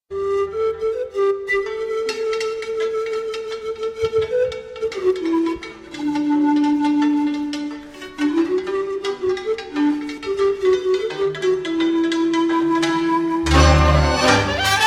HORA - Roumanie

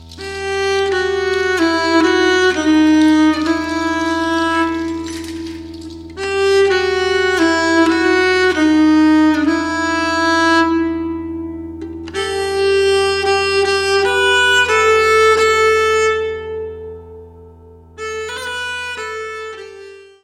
Belgique

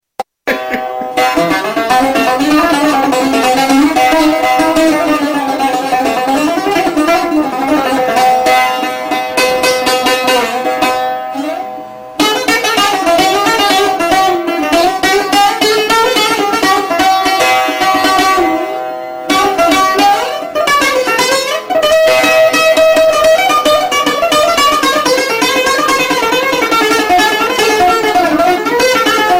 instruments bouzouki

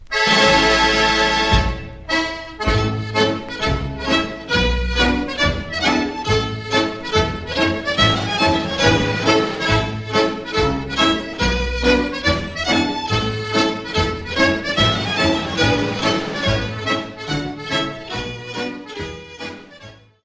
Reel - Ecosse

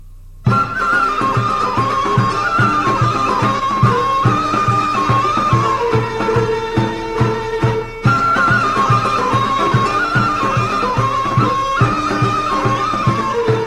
Vodeno Horo - Bulgarie

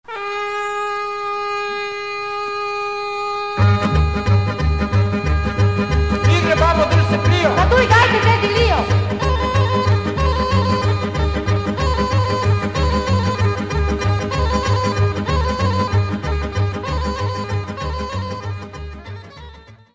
Horo - Serbie

